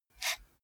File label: computer mouse draw short